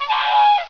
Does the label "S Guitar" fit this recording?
No